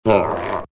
pit drone communicate; svencoop; legends

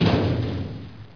duct, player; mistake coop

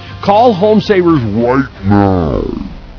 die; svencoop; cracklife; agrunt

